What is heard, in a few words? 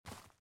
run grass